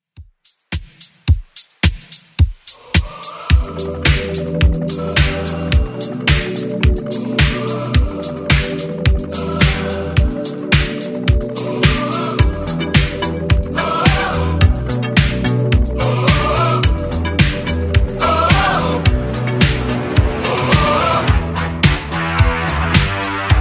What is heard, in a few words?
80s Redrum 108bpm